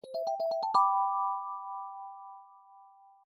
Jingle Item Collected; unsighted

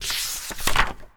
Pop Quiz Page

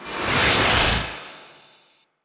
Music

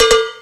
Sfx Wintergames

